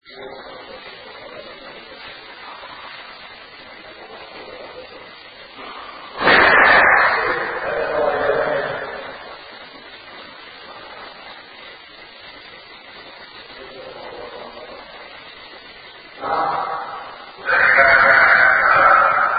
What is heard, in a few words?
Second Floor Unexplained Breath; Case 024 Pocahontas Fuel Building II